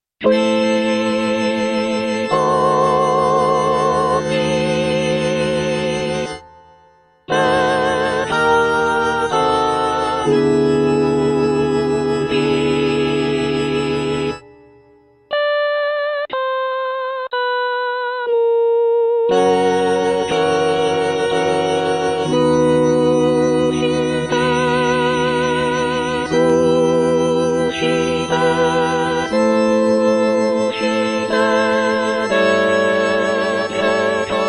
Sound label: qui tollis soprano